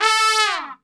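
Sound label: A 2 TRP SHF, Trumpet; Best Service; Brass Super Section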